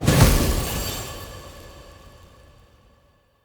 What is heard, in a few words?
Fire Mote Pickup Trail Move Burst; Motes